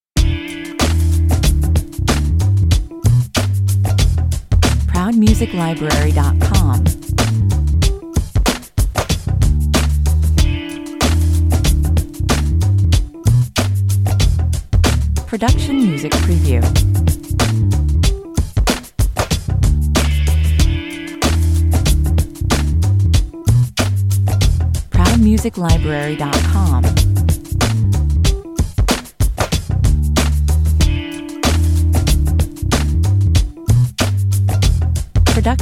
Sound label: groove 94 bpm